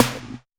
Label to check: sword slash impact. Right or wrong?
Wrong